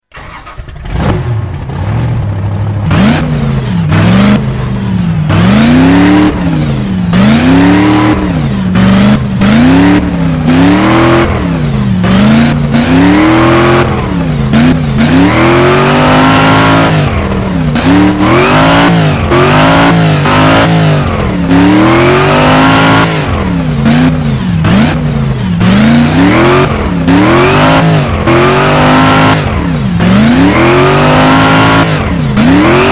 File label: Monster Truck